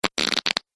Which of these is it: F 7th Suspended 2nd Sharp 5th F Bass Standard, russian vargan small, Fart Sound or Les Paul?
Fart Sound